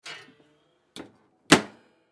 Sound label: Oven Door Close, Oven Door Close Hard Antique; Household; Appliance